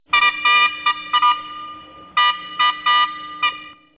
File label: Minitor VI Tone